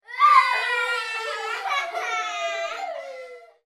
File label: human group children x6 uk aged 3 to 8 fake crying